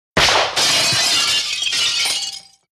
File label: Pistol Int Shot Glass